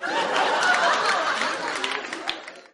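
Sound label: laugh; svencoop; road to shinnen; warai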